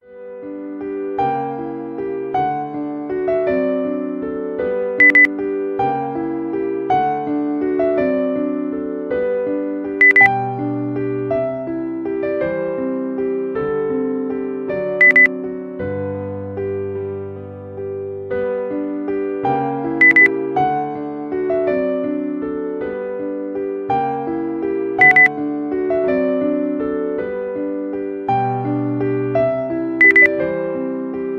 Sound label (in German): klassik